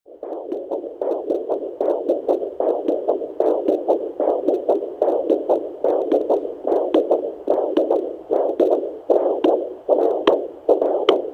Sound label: 2nd degree AVB